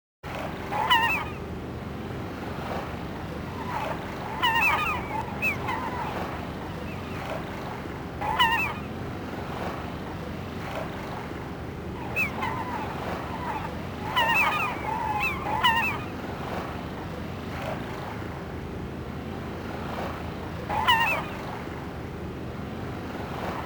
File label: Stage Ambient Music; The Last Blade; Lee Rekka